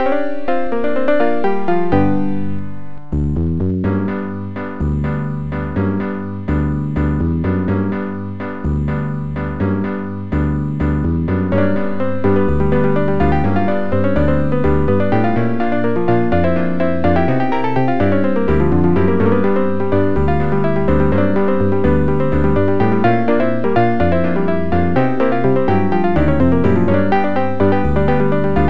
piano plinker.mod; coma favorites modland

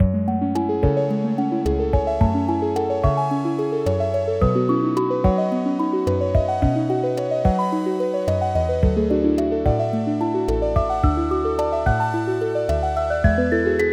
rise and sine; coma favorites modland

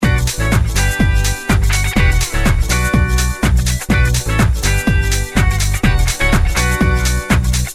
Disco Star; ringtones

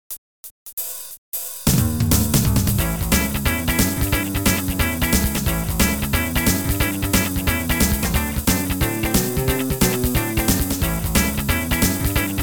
mod; coma favorites modland

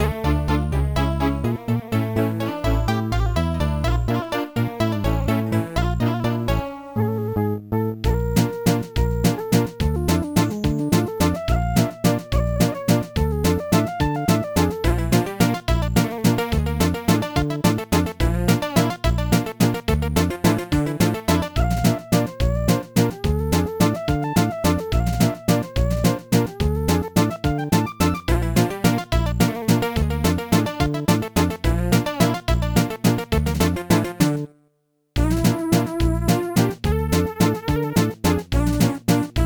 hawaian waltz, mod; coma favorites modland

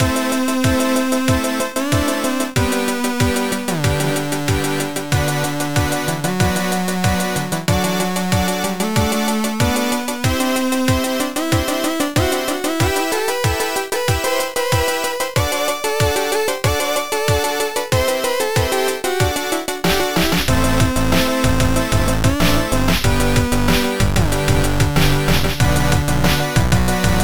intro synth; coma favorites modland

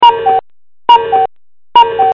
Coo Coo; ringtones

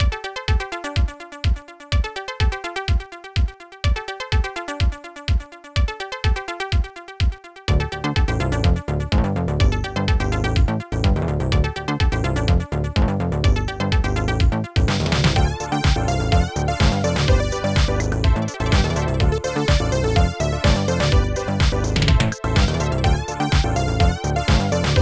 eurobeat, mod; coma favorites modland

